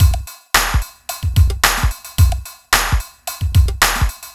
r n b kit 110

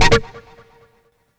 Synth, Techno

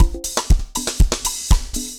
dancefloor perc 120 bpm

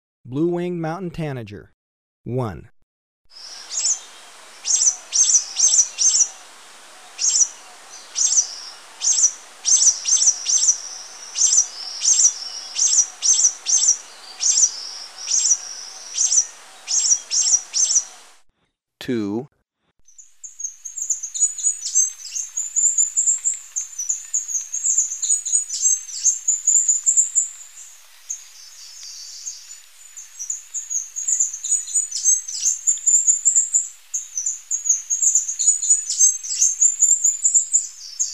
Blue-winged Mountain-Tanager, Birds of Ecuador; S. America

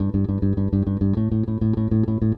Stingray, Rock Bass; Old Grey Stingray

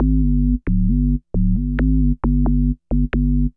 Bass, Breakbeat

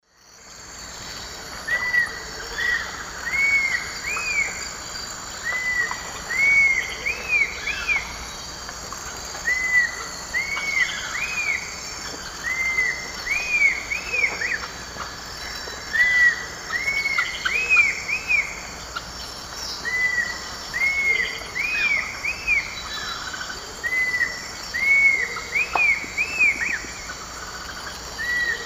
Attila cinnamomeus, song; S. America; Eastern Lowlands

